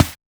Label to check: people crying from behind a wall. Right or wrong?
Wrong